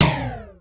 Lo Thang, Drum Machines Alesis D4

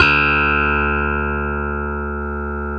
E Bass Hamr; Club-50 - Foundations Roland

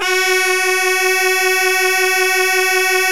MIDJWIZ G1; Midjwiz & Shaku

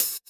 Open Hhat, Hihat; Equipped Music; Loungin House

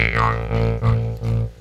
DRAGONBREATH; SFX; Syntec; Wall of Sounds; TECHNO-BOX

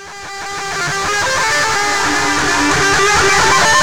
FX GTR, GUITARS KIT

